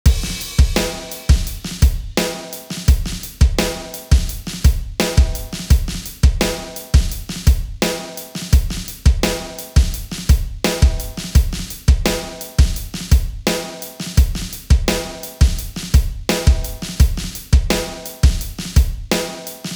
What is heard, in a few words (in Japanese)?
ゴーストノート, drums